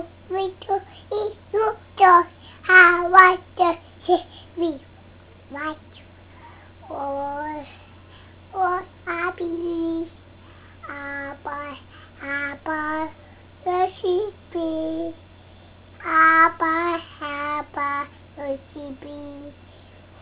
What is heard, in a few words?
twinkle